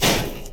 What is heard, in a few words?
Grate Door Break